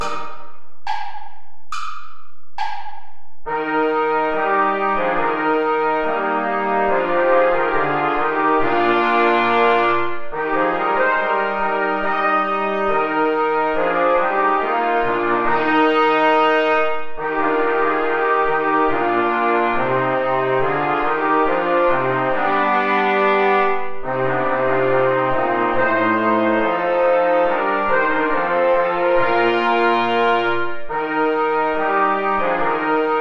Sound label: play along without trombone; haendel georg friedrich; zion daughter rejoice